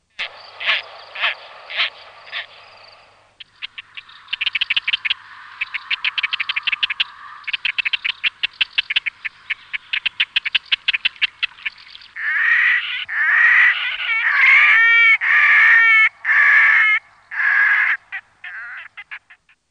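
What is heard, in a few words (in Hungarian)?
Vörösnyakú vöcsök; Madarak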